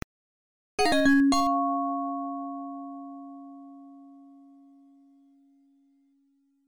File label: Coin; Gladiator; arcade